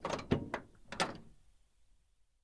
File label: Camaro Door Open; chevy camaro